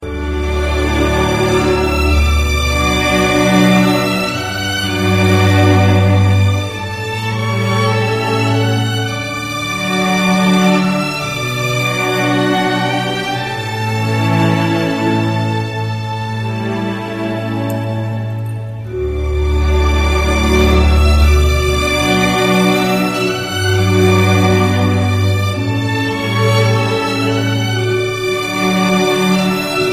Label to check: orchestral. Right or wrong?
Right